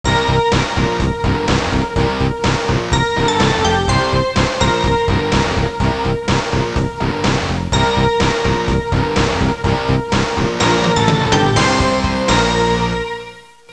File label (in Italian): suonerie musica rock